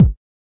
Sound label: kik